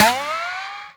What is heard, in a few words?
ricochet, bullet impacts; physics; Half Life Model Pack